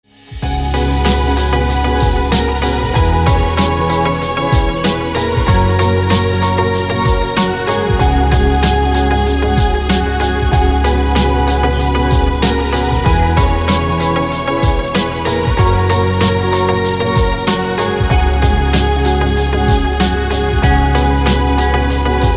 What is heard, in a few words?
trance lounge mix